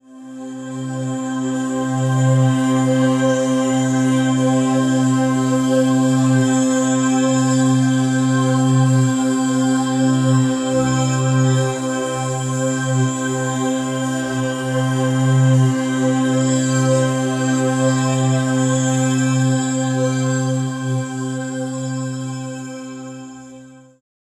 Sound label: Locational Drone, pad; Sonic Foundry (Sony Creative Software); James Johnson Spektral Minimalism; Pad FX